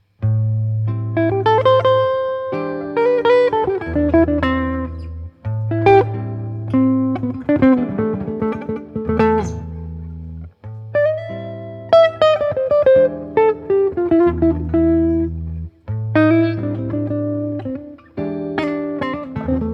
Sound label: Guitar Sample Track